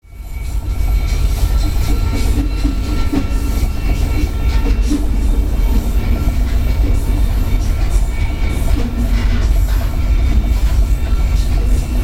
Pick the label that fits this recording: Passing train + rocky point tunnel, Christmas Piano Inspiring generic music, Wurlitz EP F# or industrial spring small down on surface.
Passing train + rocky point tunnel